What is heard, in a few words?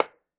sling wood, attack impact